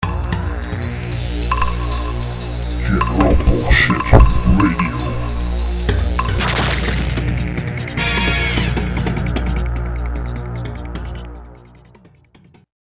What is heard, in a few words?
jingle